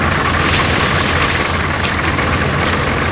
Tank Loop Forest; Civ4

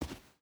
footstep boots sand walk; Rust

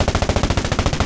loop shvak tnsh

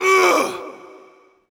player heavyhurt

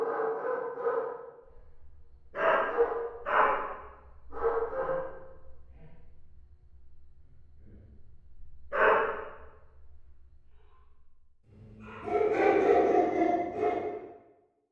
ambient port; clanbase

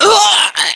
death

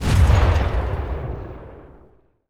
Automatic 2A72 End, Weapons; DCS

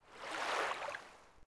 Oasis Wave; Civ4 warlords

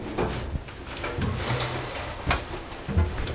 Vehicle reloads; Red Orchestra: Ostfront 41-45

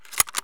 sv98 bolt in; Escape from Tarkov

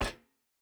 footstep hide metal run; Rust

